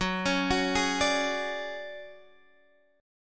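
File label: D Major Sharp 11th G flat, chord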